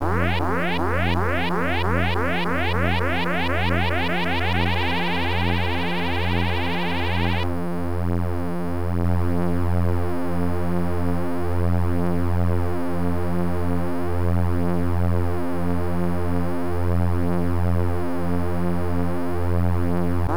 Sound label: Warp; Feedback; msx2